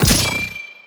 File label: p atk reticentsword